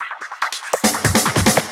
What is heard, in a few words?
Fills, Loops 140 BPM